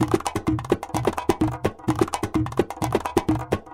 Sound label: Latin House Loop 128 BPM; Vengeance Samples